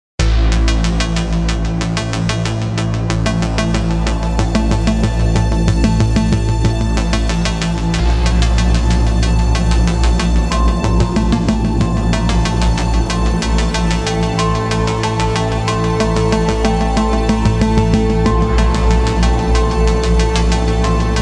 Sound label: dramaturgy